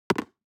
DICE on Cardboard, Throw and Roll, Metal, 1 One Die; Samples; ArticulatedSounds; DICE Sfx Party (Extended)